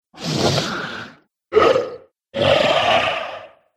Trolls Selection, Monster; horror

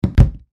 Impact Body Fall Wood Floor; Wrestling and Struggling; SFX Bible

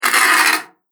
Wooden Stick Scratching Stone; foley; impact; Miscellaneous Impact Sound Effects; Andrew Fleming

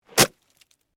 Shovel Stab Mud, Impact; Yard Tool Sound Effects; Yard Tools (Sound Effects:Tools); BlastWave

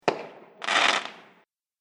Fireworks Bang And Sparkle; Firework Sound Effect; Andrew Fleming

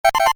Computer Beep, Short; Multimedia; Stuart Duffield

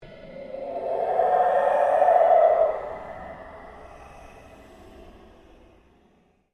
Ambient Pulse Ghost Chatter; Sound effects created by manipulating other sounds; Scary Soundscape; Scary Soundscapes

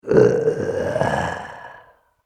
Halloween Zombie; horror; Zomby Sound Effects

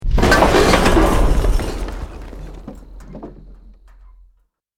BUILDING COLLAPSE SCAFFOLDING SMALL; Created dramatic effects of buildings collapsing or breaking; Construction

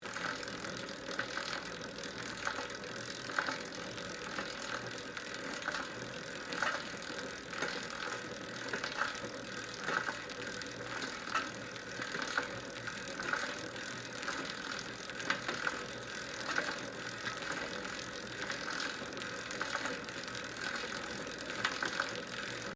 Bicycle Pedal, Schwinn, Vintage, Loop; Sports; Bicycles

